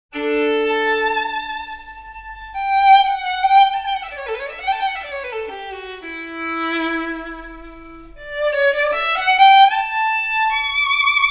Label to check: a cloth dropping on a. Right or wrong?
Wrong